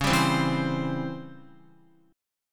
Db Minor Major 7th Flat 5th Db Guitar, chord; DbmM7b5 strummed; JGuitar

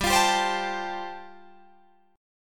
G 7th Suspended 2nd Suspended 4th, Guitar Drop D

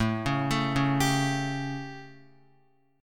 A 7th Guitar, chord; x,0,1,2,1,3; A7 arpeggiated

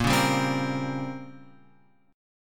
A sharp Minor 6th Add 9th; A#m6add9 Chord {6 4 5 5 X 6} strummed

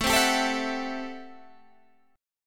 A Minor 13th, Guitar, Standard; Am13 strummed; 17,15,17,14,17,14; JGuitar

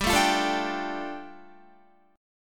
G 7th Suspended 2nd, Guitar; G7sus2 strummed

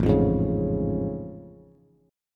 A Augmented Major 7th A Bass; strummed; JGuitar

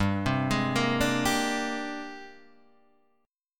Ab Major Sharp 11th G

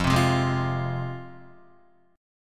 F Minor F down Guitar; strummed; JGuitar

